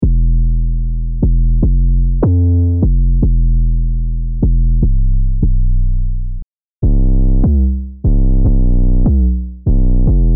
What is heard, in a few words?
Hip Hop Rap 808